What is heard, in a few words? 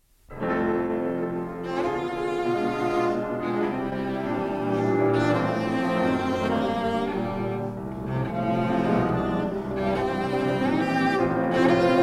Cello Sonata No. 2 in F Major I. Allegro Vivace (Live); Brahms; Op. 99